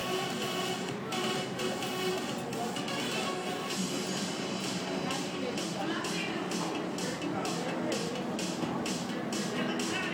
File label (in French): Ambiance dans les magasins d'Hollywood